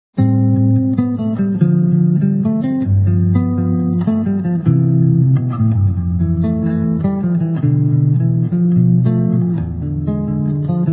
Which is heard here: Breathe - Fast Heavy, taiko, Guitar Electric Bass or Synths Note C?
Guitar Electric Bass